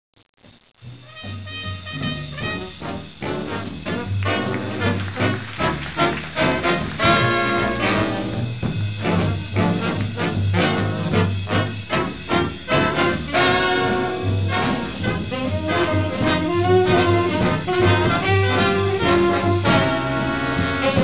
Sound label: jazz